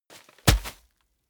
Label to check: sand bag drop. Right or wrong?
Right